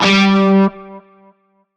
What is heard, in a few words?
Shawzin Oct Two G; Music; Warframe; Narmer Shawzin